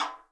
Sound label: REPINIQUE